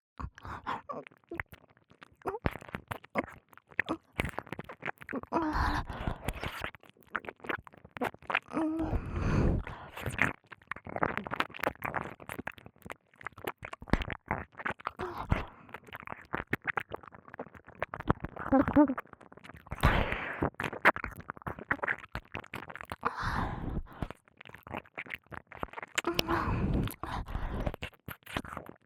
右耳舐め(激しい)

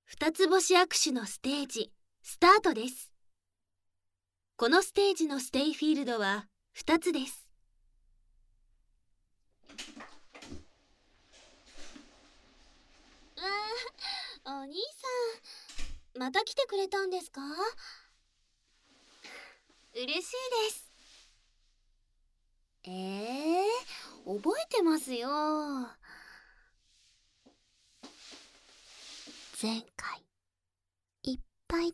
耳舐め手コキ; 2つ星～耳舐め手コキ握手～

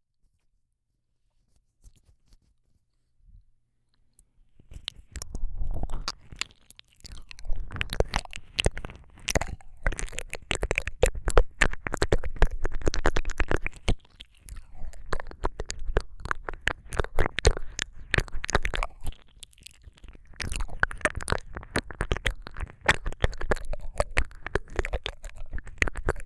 ペロペロレロレロ耳舐め, ASMR

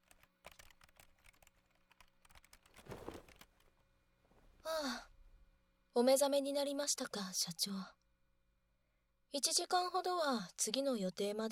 お耳舐めて欲しいんですか？; 手コキ音なし; 秘書さんといっしょ！～仮眠室ヒーリング～; ダミーヘッド&ダミーボディマイク使用

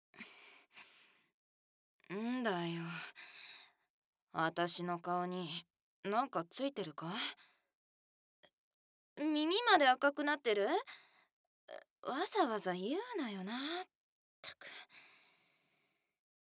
耳舐め手コキ, ASMR; 可愛いとか、ゆーな; よわよわ度１２０％; 左右反転

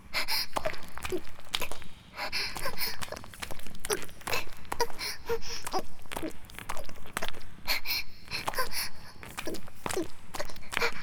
ギシアンループ; 田舎の別の無感情少女と自己犠牲愛2

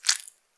膣内射精前, 引き抜かれた瞬間; ■挿入or引き抜く

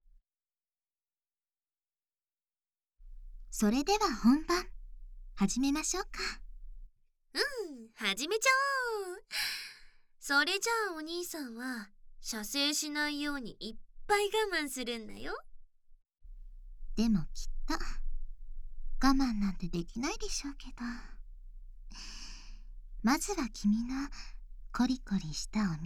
効果音なし （キス・耳舐め・ささやき・乳首責め）; 本番編

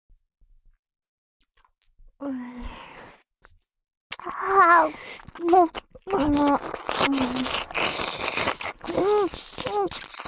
激化する耳舐め, ASMR

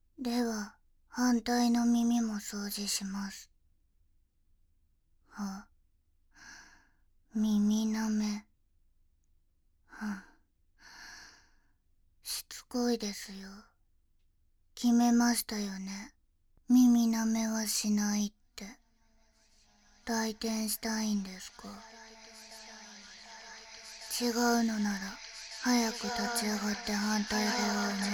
【SEOFF】【左右連続☆即耳舐め】; 本当はＮＧなのに洗脳ノイズなら即ＯＫ。洗っていないお耳に舌が、奥の奥までずいずい♪

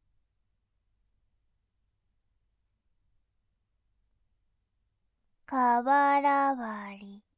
瓦割, ASMR

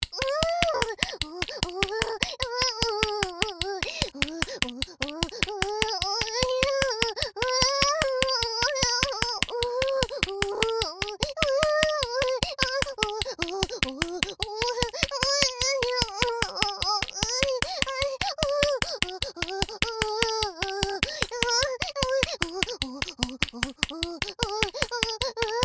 おまけのちびっ子えちえちボイス（セックスの喘ぎ声）

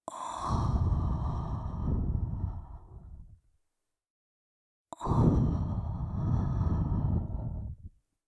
吐息

